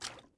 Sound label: fs water, footsteps